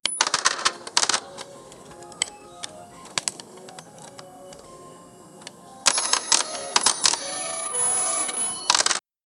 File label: casino